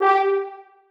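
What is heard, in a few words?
horns staccato g4; Sonatina Symphonic Orchestra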